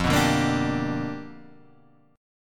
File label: G 7th Sharp 9th F chord